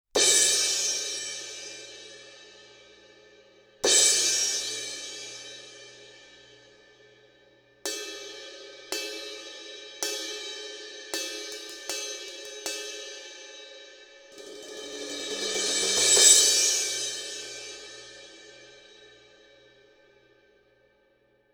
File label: Cymb Crash; Close Edge